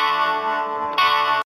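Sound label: Notification Ringtone; Vivo Y21T; India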